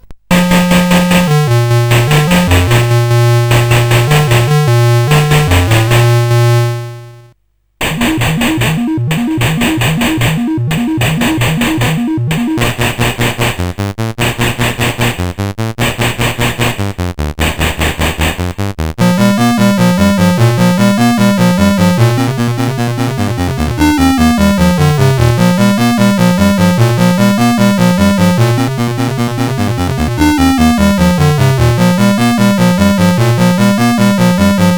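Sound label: Puppys, MOS 8580 SID chip music, games; CSG8580R5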